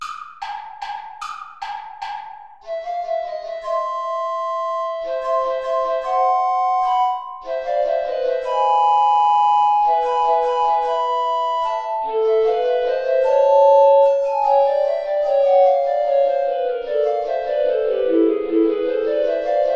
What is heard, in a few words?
play along without guitar; traditional